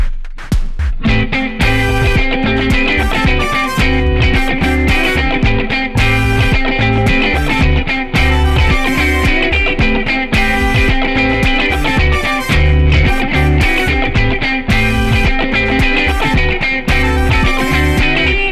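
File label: acid jazz guitar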